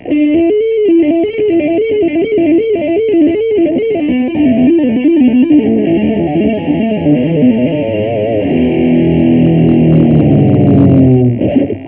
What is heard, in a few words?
tapping frenzy, hot licks